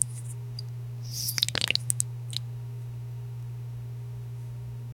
panna cotta being unmould, dessert

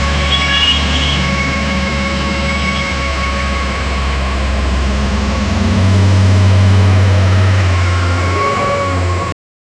the sound of a heavy, road

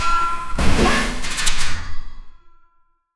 eletronic heavy metal gat, gate-closing

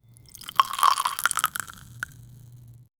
sparkling wine is slowly, liquid

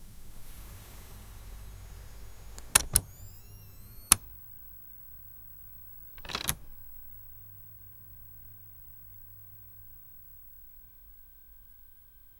turning on the laser; activation

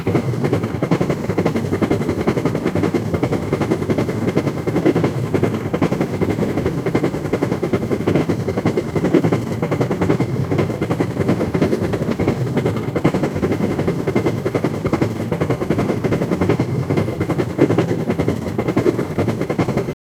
sound of real steam engine

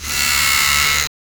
shaving beard; personal care

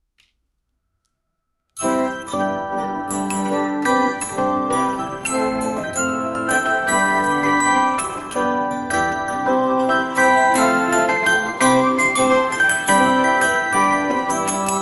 christmas dance

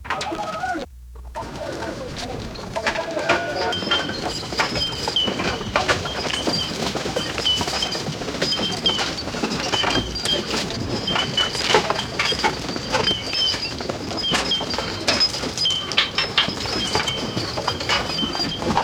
eastern arabian souk pott, marketplace

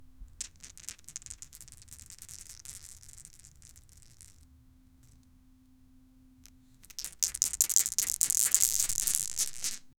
two elastic weighty balls; props

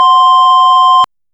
an alarm effect

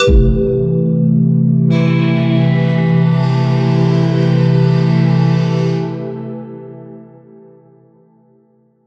gacha game case opening, anticipation

